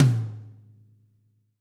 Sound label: TD-20 Tom A, Roland TD-20 Toms